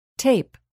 tape

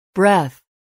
breath

